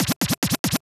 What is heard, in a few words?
Vinyl FX and Scratches, FX Scratch